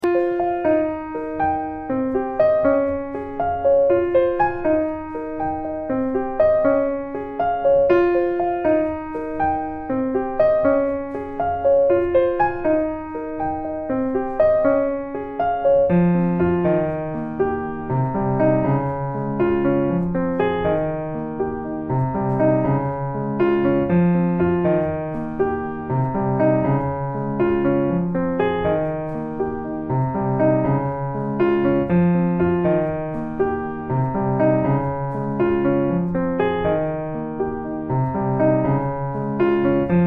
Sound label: Piano